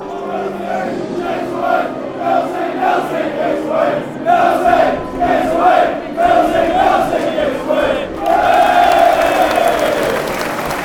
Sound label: give us wave, fanchants; millsy